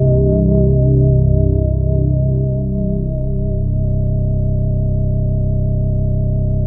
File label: Bell Syn C2